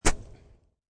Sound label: Grass whiff of a golf practice swing.; Sports